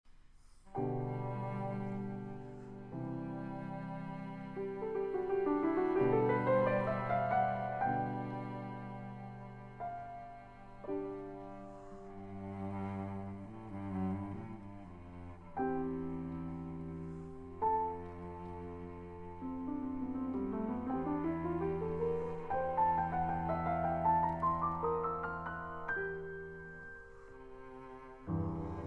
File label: Sonate C dur, cello; Beethoven